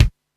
DDD-1 KICK, drum kit; Polyend; Stazma & Concrete Collage; Concrete-DDD1

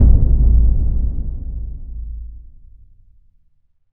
sonic boom; Spectrasonic Distorted Reality 2

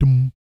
BS DMM, Vocal Planet Jazz & FX; ILIO; BASS DUMMS

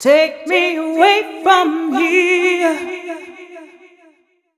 f 126 mel f-sharp, fem Vocals

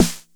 Bass Snare, Jomox Airbase99

